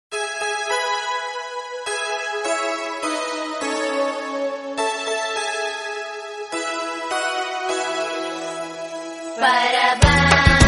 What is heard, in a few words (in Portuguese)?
Parabéns; Festivas; Jardim de Infância